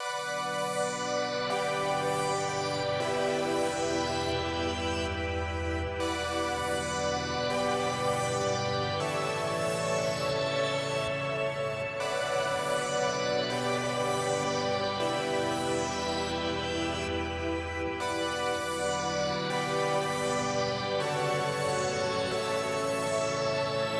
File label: synth pads; shoegaze